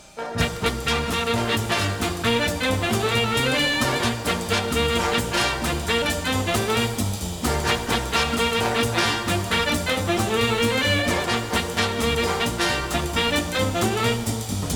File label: Orchester